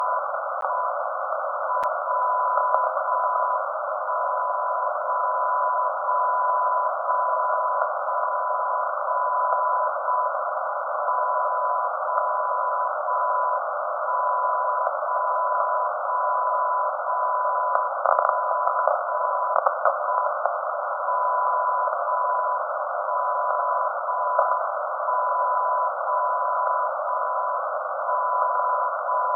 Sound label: hf 40khz